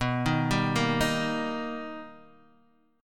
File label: B Augmented Major 7th B Guitar DADGAD, chord